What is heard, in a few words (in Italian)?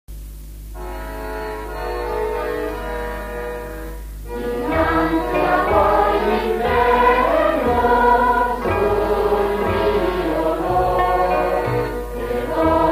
Canto della Promessa, Canti scout